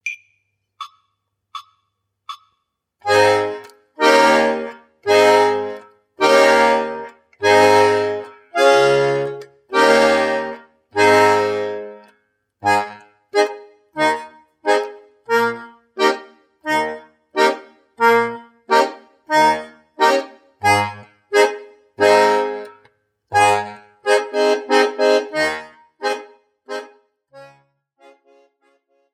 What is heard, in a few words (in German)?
Begleiten in As Dur